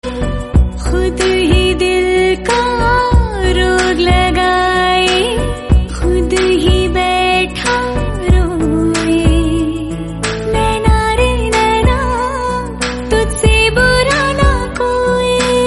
ring

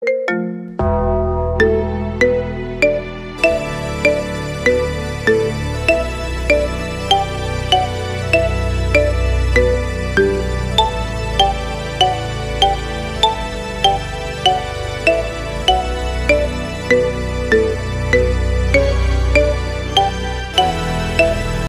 theme song marimba remix ringtone; the undertaker

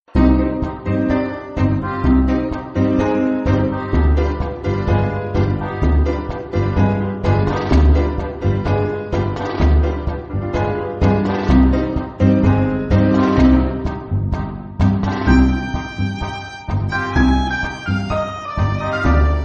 romantic

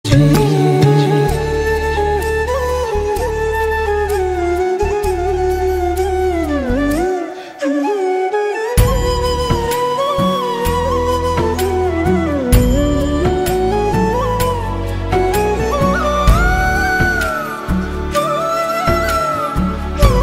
flute fusion

